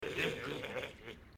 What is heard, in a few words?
snorts; simple ajax chat